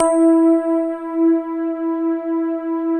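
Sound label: electric piano pad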